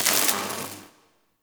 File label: Elec Spark; Warframe; Nokko Colony; Ambience